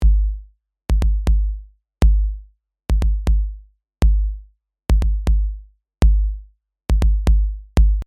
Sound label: Kick